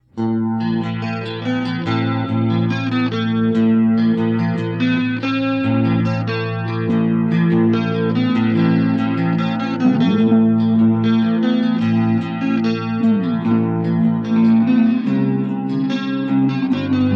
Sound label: Riff Tapes